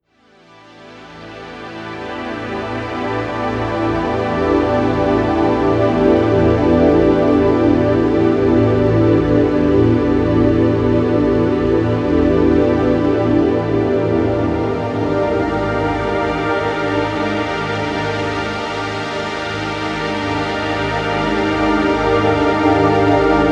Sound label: moog modular lexicon; arturia